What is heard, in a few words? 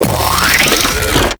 Exploding Arm Charge; Enemies; Warframe; ManInTheWall